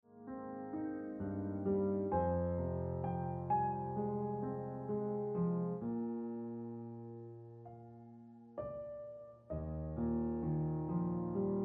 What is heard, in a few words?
Gentle Ringtone Piano Music